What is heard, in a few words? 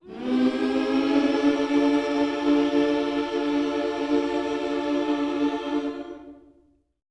MM MINOR, Vocal Gospel